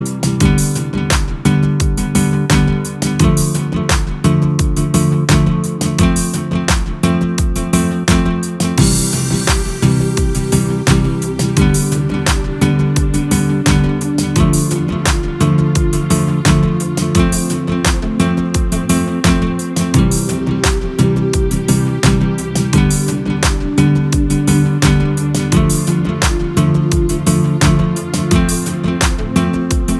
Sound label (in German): musik hoerprobe